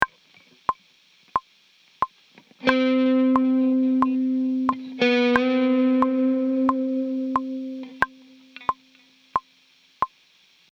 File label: Lick